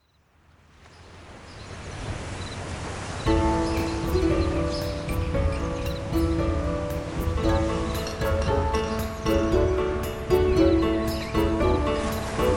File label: Soundscape Version; Windfall; The Legend of Zelda The Wind Waker